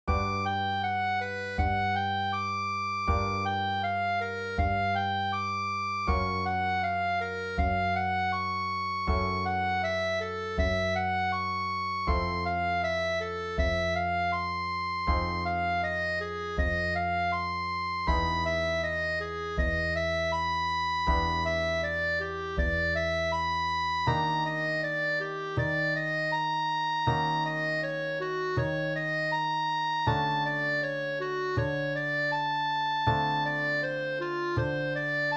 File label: drop 2 voicing play along